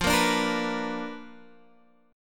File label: B Minor Major 7th Flat 5th, chord